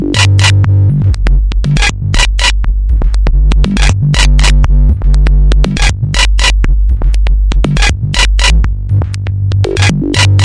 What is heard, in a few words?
120 acid, synth and electronic loops; melodic loops; oizo